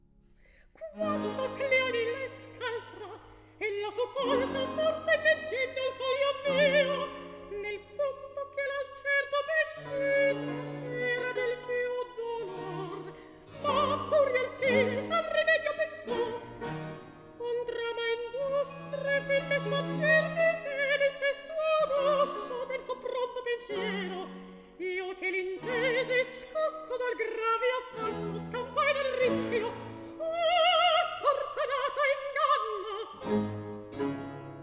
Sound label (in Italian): Recitativo; Atto II Scena 11; Caio; Antonio Vivaldi (1678 - 1741); Vivaldi - Ottone in villa (2010)